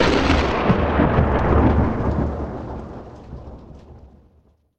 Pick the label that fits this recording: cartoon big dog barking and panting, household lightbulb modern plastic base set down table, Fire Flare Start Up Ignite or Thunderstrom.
Thunderstrom